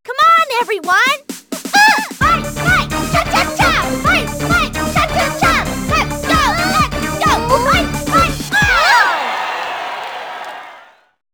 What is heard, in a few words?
fight cheer